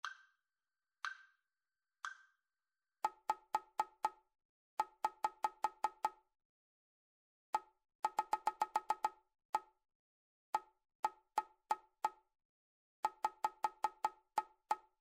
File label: rhythmic dictation; ear training